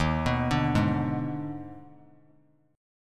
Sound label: D sharp Major 7th Suspended 4th Sharp 5th; arpeggiated; JGuitar